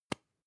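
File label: lipstick open, Bathroom; haus-garten; badezimmer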